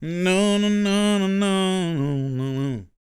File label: MOANIN, Vocal Planet Blues & Soul; ILIO; Vocal Planet VOL-2